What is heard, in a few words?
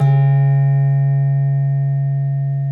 GENDER LO, Bronze Gamelan; E-MU Formula 4000 Series Vol. 4; Earth Tones